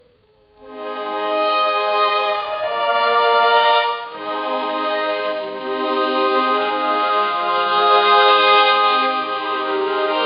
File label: classic vintage orc, soothing; 1970s